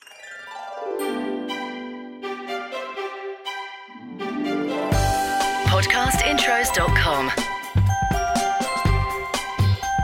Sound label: Podcast Watermark LOUNGE SWING Jetset